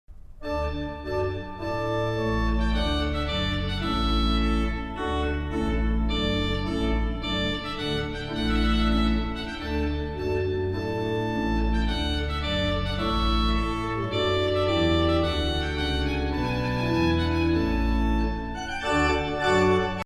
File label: Trumpet tune in D major; Purcell